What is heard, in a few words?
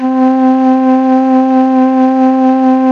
MELLOTRON, Ensoniq TS-10; Rubber Chicken Software Sample Bank; TS-10 2